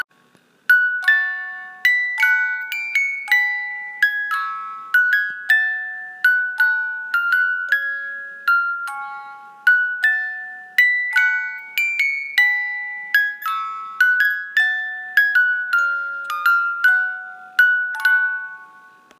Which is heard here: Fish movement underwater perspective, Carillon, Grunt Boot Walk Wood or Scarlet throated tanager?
Carillon